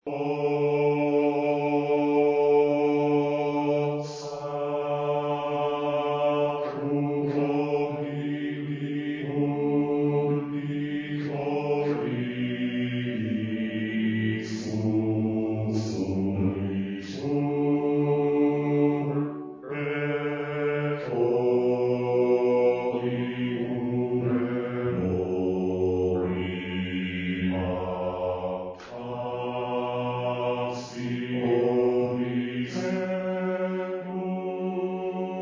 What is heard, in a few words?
bass; o sacrum convivium bassi